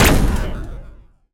invader weapon scifi shot; bluezone; sonniss gdc

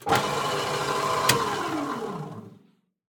turning lathe; sonniss gdc

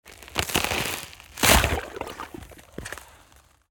ice crack break water; bluezone; sonniss gdc

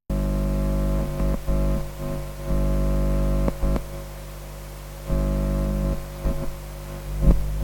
pedal fx; fvtt sonniss gdc audio

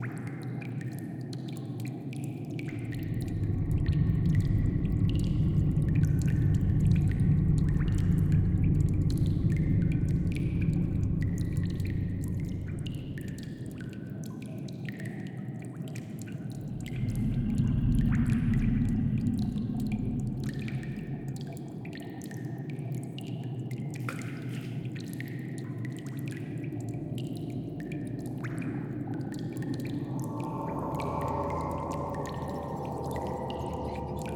basilisk lair ambiance

